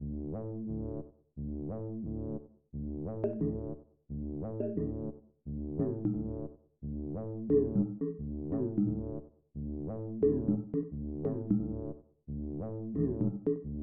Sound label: menu, music